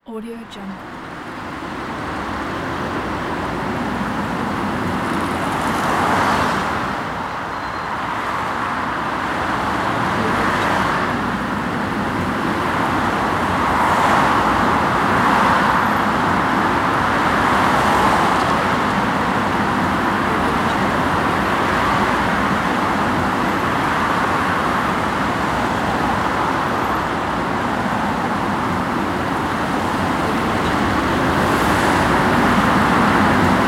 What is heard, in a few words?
traffic cars in the city; fx-library